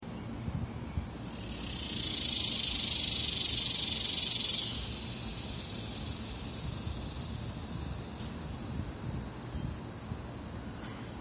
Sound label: Cicada calling in Irving, TX in June